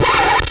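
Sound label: scratch, record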